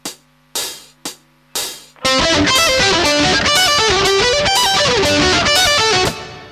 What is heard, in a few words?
advanced arpeg